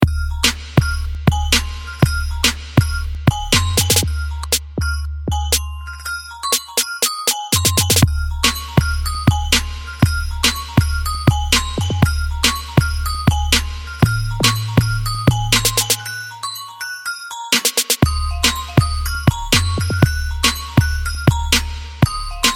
hiphop

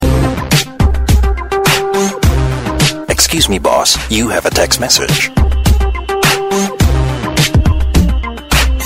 Office Tone

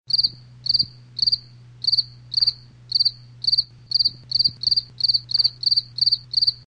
cricket grillo; animals

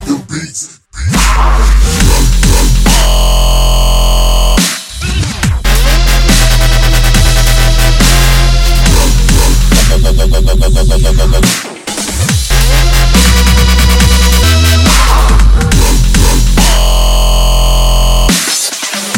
dubstep; electronic

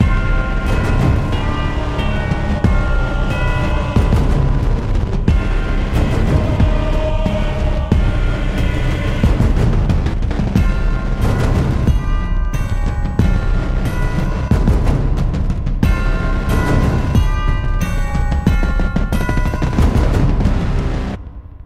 SMS, ringtones

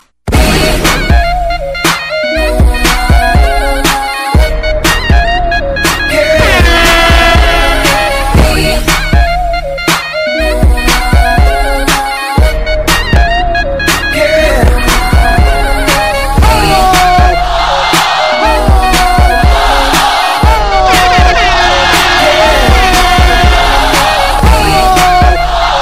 Arabian Hip Hop Mix

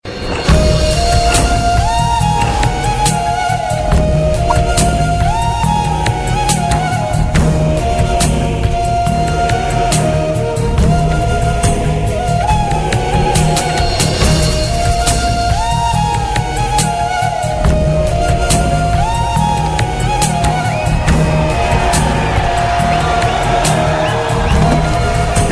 Sad Love Theme

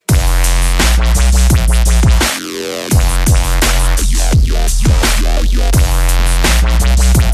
dubstep bass

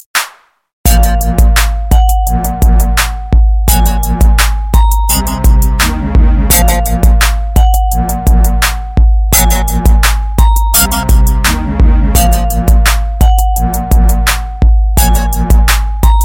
beats

